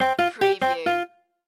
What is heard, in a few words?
Casino Electronic Sound New Game